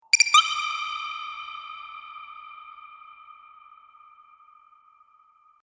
Game Cavern Tokens; Video Game Sound Effects

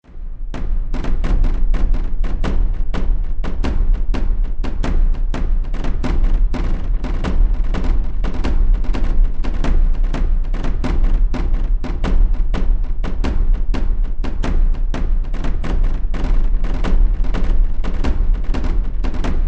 Drums Of Chaos, scary soundscape; Scary Soundscape Sound Effects

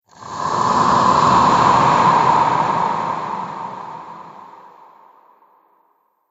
Pyramid Phantom, ghost sound effects

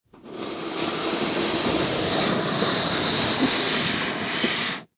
Gift Box Full Grab, Gift Box Full Grab Slide; Gift Box Full Grab Slide Slow; Foley

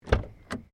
CAR SUBARU LIBERTY EXTERIOR DOOR OPEN; Subaru Liberty 20 01; Family members Subaru; Vehicles

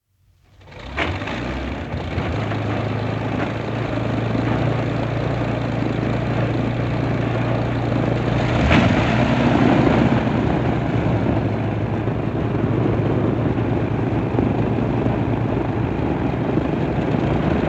Exterior, start engines, runs, airplane; From 78 rpm disc; BBC

